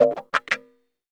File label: WAH KA CHAK, Bizarre Guitar, WEIRD WAH; Spectrasonics